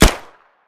PDW, Guns Fire

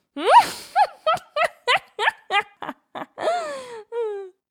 female laugh; Voices Emotes

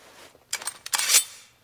hidden blade deploy; Weapons; Melee

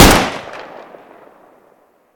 SKS, Guns Fire; Weapons; Civ

